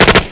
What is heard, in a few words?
steyer; SpecOps2 MPlayer Demo